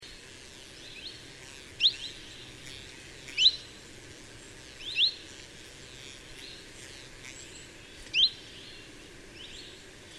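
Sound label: Chiffchaff (Phylloscopus collybita); Noarootsi; All from Noarootsi, Läänemaa, Estonia, 6 August 2005.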